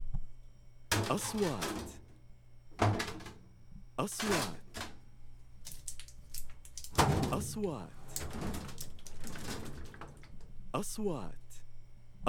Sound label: Water Bottles Kitchen